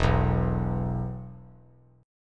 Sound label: E 5th; strummed; JGuitar